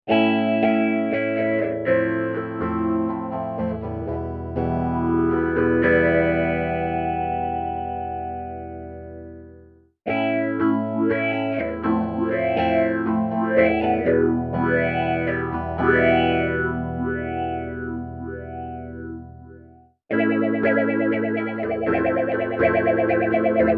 phozer, guitar (bridge pickup) - effect - sound card (software cab sim); Clips 1 and 2: 0% Drive, 50%, Gain 100% Gain